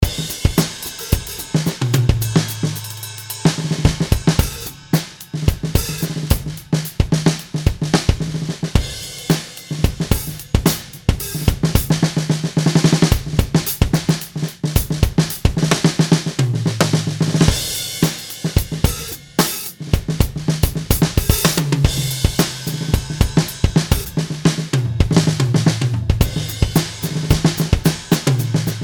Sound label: POP; BFD3 Preset